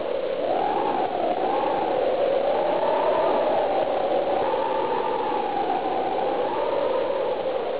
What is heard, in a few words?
wind; The Tempest